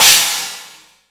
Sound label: rush, cymbals